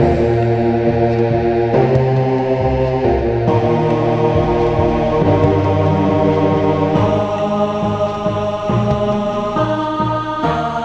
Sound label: Cinematic Monk Spirit; Hunter's Legacy Purrfect Edition